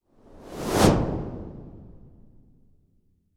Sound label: sound design transition whoosh short windy style; zapsplat